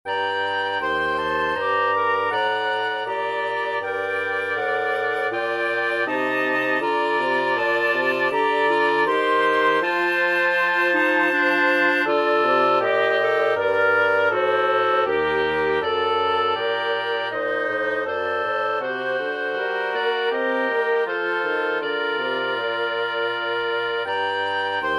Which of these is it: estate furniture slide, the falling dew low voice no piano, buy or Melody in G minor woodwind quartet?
Melody in G minor woodwind quartet